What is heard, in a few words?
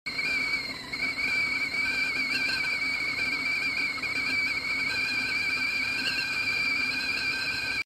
Water kettle whistle; سوت کتری آب